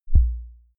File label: sub kick clean transient